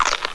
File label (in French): Mange Carotte